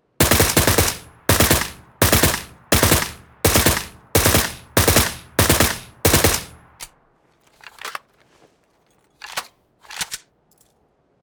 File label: Suppressed Beryl burst fire